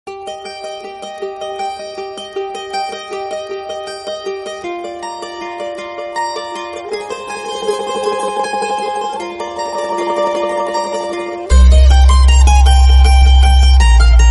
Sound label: Sitar Bgm